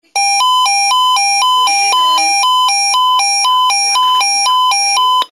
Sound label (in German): Feuerwehrsirene